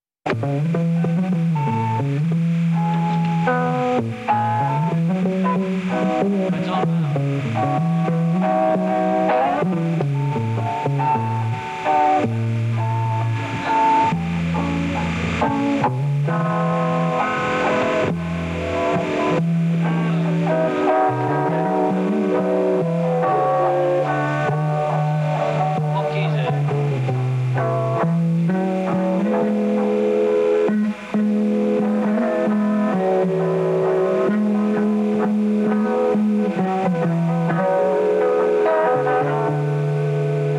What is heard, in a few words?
Improvisation